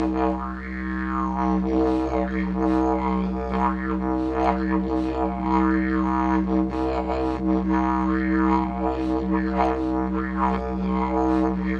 Digiridoo

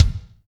BD, DR BD; Best Service Gigapack 2

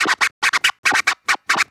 Vocal Scratches 140BPM; Vengeance - Essential Clubsounds Vol.4

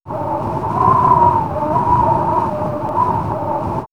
Nature Spooky WInd; X-Static Goldmine

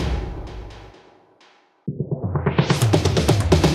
Fills 128BPM, Clubsounds

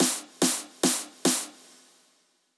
144 BPM Roll Snare; Vengeance - Essential Clubsounds Vol.5; Flight Kit Root Amin; Drums & Perc

